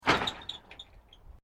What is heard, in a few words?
Metal Corrugated Iron Wind Movement; Material components to create more complex sounds